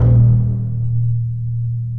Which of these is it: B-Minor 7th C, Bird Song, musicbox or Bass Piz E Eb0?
Bass Piz E Eb0